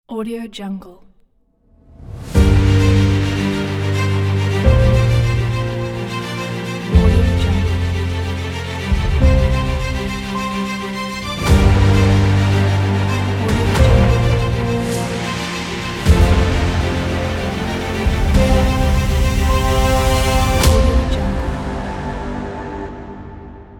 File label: epic intro ident